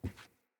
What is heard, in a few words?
Grunt Boot Walk Wood, Footsteps, Boots; Enemy; Sinecure; Melee Grunt